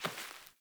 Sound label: Sandals Walk Vegetation, Footsteps; Player